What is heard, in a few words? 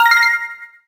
confirm